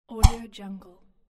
crossbow shot

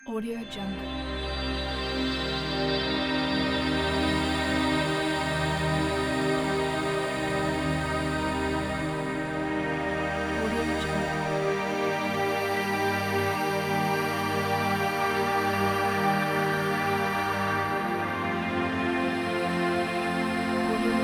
meditation pack

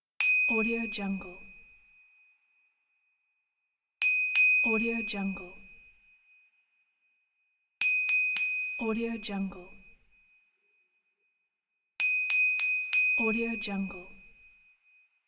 ding

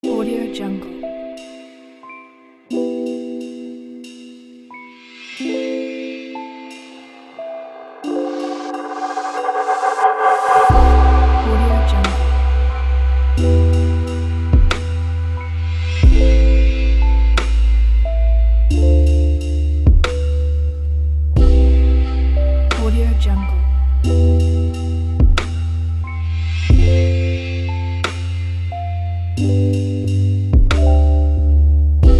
chillout soundscape; vorkuta

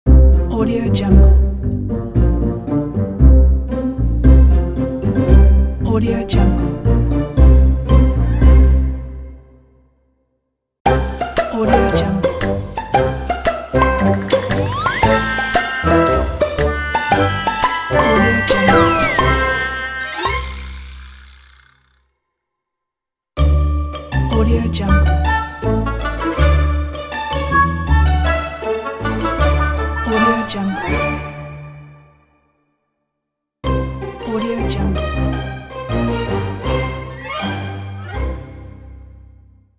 funny kids logo pack

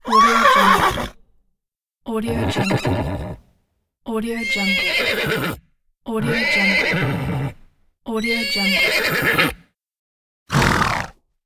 horse

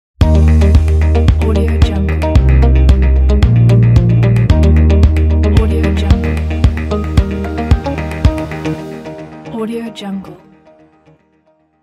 inspiration logo

